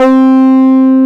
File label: TB 303